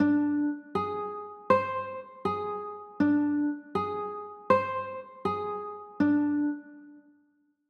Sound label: d 7 sharp 9, chords; d 7 sharp 11